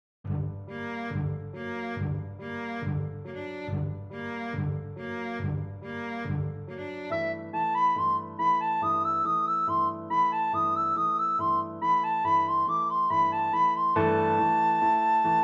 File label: Overture snel; Sergei Prokofiev